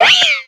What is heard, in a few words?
meowstic; cries